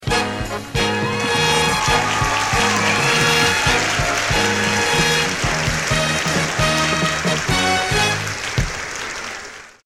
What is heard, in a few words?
audience money game with cheers